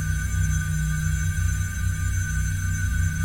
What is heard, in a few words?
free Spin Portal; goldengrimoire